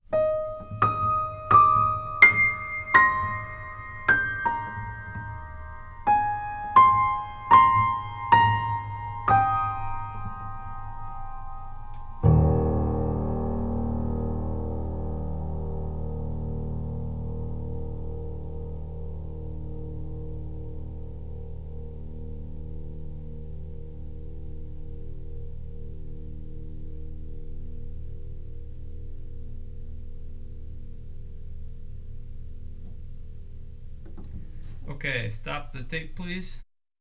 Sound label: piano noodles